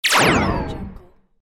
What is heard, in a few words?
beam blast